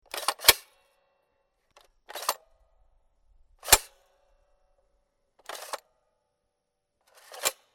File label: breechblock